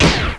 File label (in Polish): STRZAL